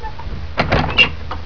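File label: door open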